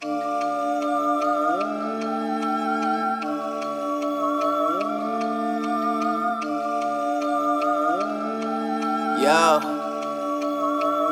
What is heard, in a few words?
rock